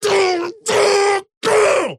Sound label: attack effort large; dota; axe